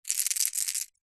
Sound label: foley gemstones several small opal amethyst jade shake in hand